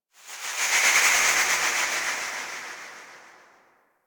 Gas City Distant Wind Textures; Ambience; Warframe; Corpus Gas City

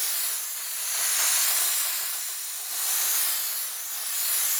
Deflation Loop; Peculiar Inflation Mod

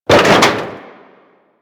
Step Metal Debris; Enemies; Steps; Warframe; Corpus; Arachnoid Camper

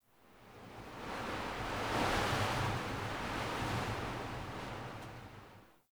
Wind Airy Gust; Ambience; Dungeons; Under City

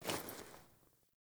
Foley Action Default Block, Default Clothing; Characters; Player

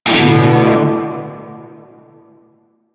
Shawzin Maj Chord L; Music; Warframe; Narmer Shawzin

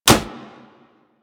Melee Fist Impact Generic; Warframe; Weapons; Fists

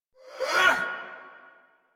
Attack Effort; Warframe; Dax Ashigaru; en

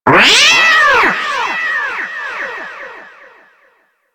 Kavat Vasca Captured Lift Away, Hunting Critters; Warframe

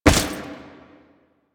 Step Default, Foley, Footsteps

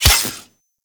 Melee Sword Impact Robotic Flesh; Weapons; Impacts; Swords

